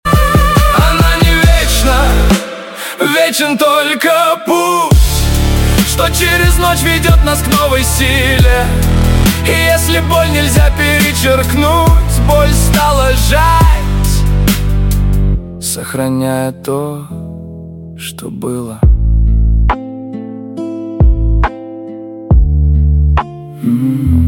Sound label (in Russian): melodiya